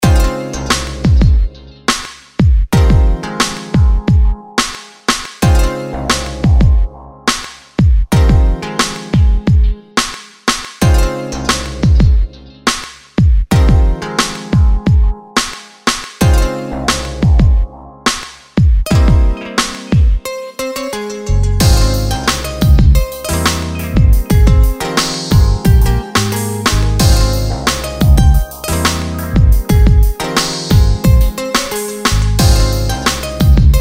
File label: beatbattle